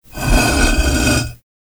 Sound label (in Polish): otwieranie paszczy kamiennego posagu